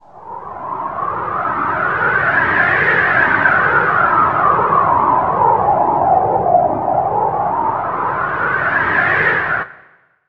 synth sfx; Chillout (ambient1&2)

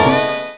HIT, Hits & Brass

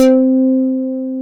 Dynamic Bass; Best Service Gigapack 2

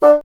WND BSN, Bassoon

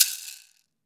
wood shaker; AKAI S-Series CD-ROM Sound Library VOL-5

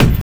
BD, Drum & Bass, 140 BPM; Ueberschall; Drum & Bass Flash Trackz

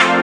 SYN DANCE; Sound & Vision - Gigapack II

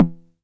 909 SD Tape

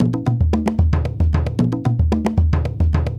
African Drum 75 bpm

